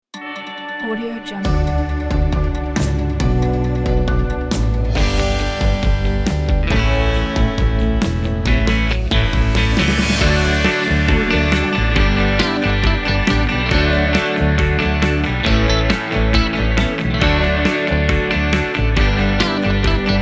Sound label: heartland rock